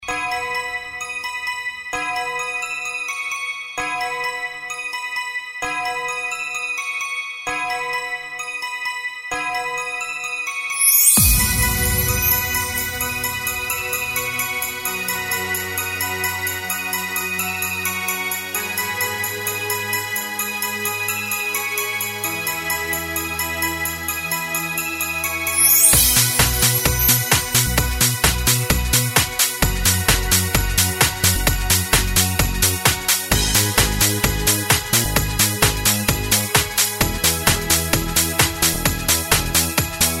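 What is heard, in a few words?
catchy melody with bells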